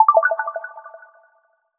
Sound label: Goal